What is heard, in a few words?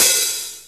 XR-10; Best Service Gigapack 2